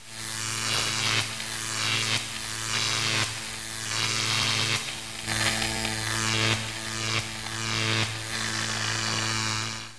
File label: electric